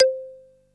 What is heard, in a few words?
MARIMBA C4; East West; Global Sounds